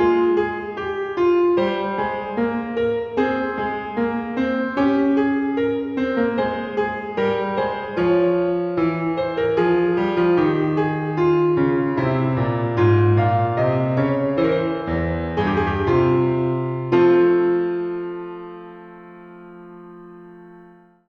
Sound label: Sinfonia; Attend Court; Long Live the Queen